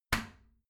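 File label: toilet seat lid close regular, bathroom; haus-garten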